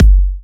Kicks; Vengeance Samples

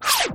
FX Scratching; vengeance essential club sounds-3

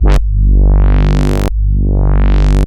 Roland A G1, synth samples; Roland S100; musicradar-synth-samples

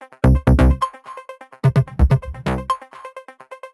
128BPM Melody; Vengeance Samples; VFH1 128BPM Big Tee Kit

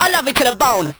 Vocal Phrases; Vengeance Samples; Vocals

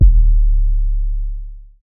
Bass Drop, 808; Street Anthems Sound Kit

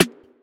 percussion; VES1 Percussion

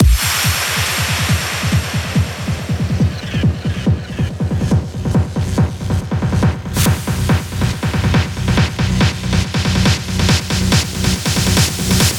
140BPM 8Bars, HandsUp & Trance Fills; Velour; Vengeance Samples

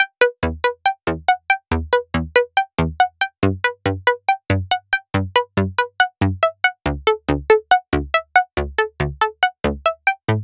Pluck Melo DRY 140BPM, E minor; Melody & Synth; Vengeance Samples; Incast Kit

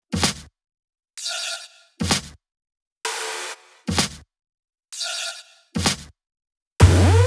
128BPM Kit; Vengeance Samples; VFH1 128BPM Contradiction Kit

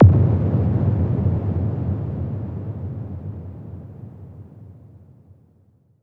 Impacts

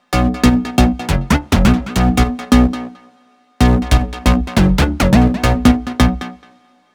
138 BPM Square Bass, Bminor; Bassline

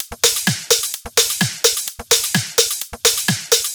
Houseloops 128BPM; Vengeance Samples

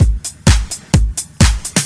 Housebeats, 128BPM; Vengeance Samples; VEH2 Loops

